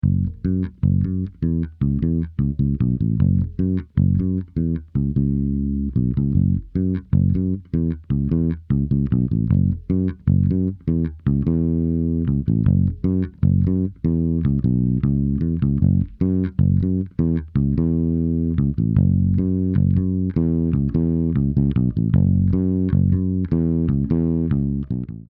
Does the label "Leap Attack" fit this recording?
No